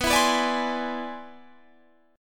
C Augmented Major 9th B

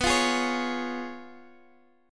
Db Major B; strummed; JGuitar

